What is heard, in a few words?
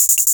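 Kay R8 hi-hat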